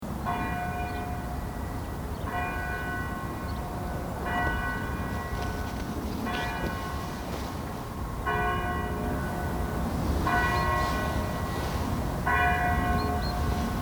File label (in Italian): campane AMONT